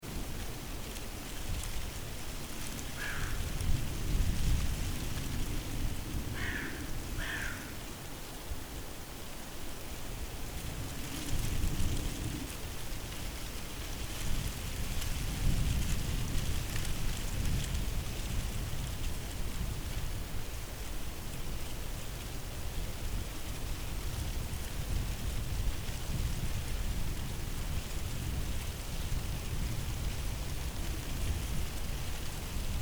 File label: Dead Leaves on a silver birch